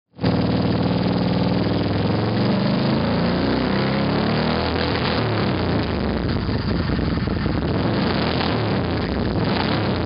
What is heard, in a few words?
ac prowler 1000 sc, exhausts